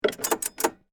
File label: Snowmobile Choke, Sports, Snowmobile, Engage, Choke, Yamaha, Exciter, 440; Miscellaneous Sport; BlastWave